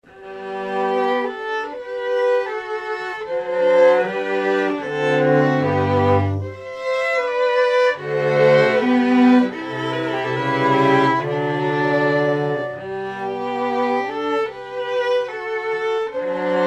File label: String Quartet; Classern Quartet; Austrian hymn